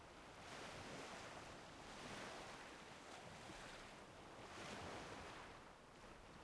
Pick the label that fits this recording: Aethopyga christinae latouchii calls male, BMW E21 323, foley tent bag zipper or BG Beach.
BG Beach